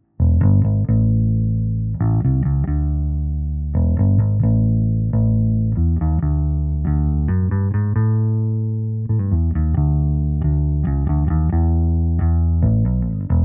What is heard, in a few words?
Pre73 Bass Amp U47fet Low Z; GAPremier